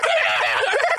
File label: wacka laugh